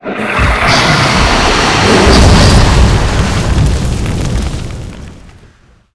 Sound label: attack, monster